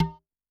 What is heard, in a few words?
Tabla X; Yamaha DTXpress-II